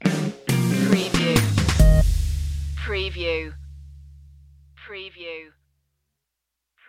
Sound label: electronic stinger